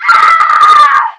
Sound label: death; action-quake2; female